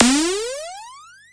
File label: gliss up